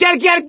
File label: scream while pounced; voice; source engine; left 4 dead 2; survivor; gambler